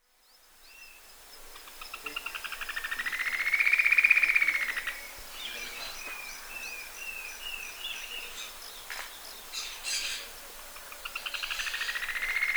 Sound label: great xenops